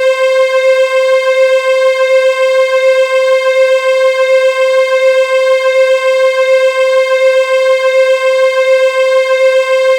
S Strng A4, Yamaha DTXpress misc voices

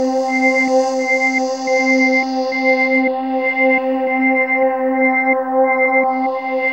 SYN MORP, Roland

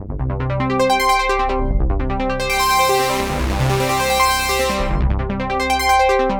filter bed, arpeggio 150; Masterbits - Tekkno Trax

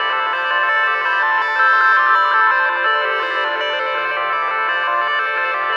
Arp Trance, Guitartronics; Sonic Foundry (Sony Creative Software); Ma Ja Le Ethereal Textures

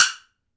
woodblock; Africa

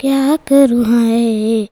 CHILD VX C, Indian Traditions

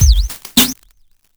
drbreak, drumbreaks; drumloops; Masterbits; Vinyl Attack

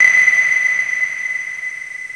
Sonar; Urban Shakedown

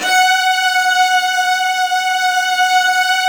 STRING PIZZA; SONIC ELEMNT; SONIC EL YES

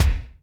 Kik Lo Techno, Alesis D4, Drum Machines

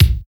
MIND KICK; Drums In Your FACE!

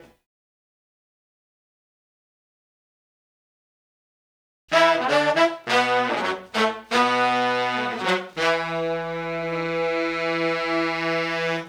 Soul Horns, 70s Analog Funk

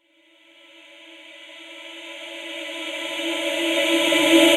Reverse Vocals A; Vengeance - Essential Clubsounds Vol.4; Effects

